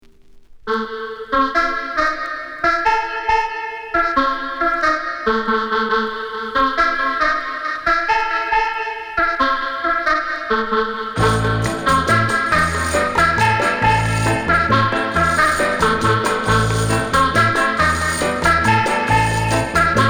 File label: waltz